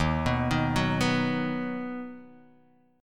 D sharp Minor Sharp 5th D sharp down Guitar Open D